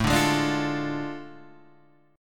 A Major 7th Suspended 4th Sharp 5th, Guitar, AM7sus4#5 chord; strummed; Standard; JGuitar